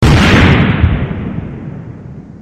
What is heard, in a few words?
GOOD EXPLOSION